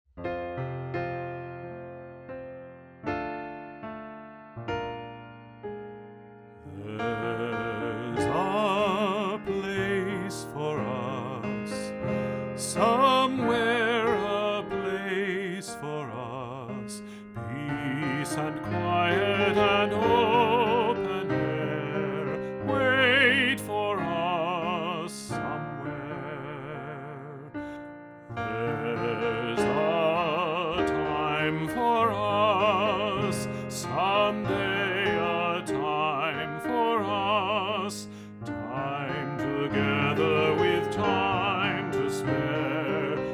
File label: Baritone Piano